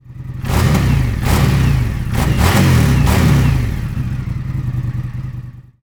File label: FTR Dynamic; INDIAN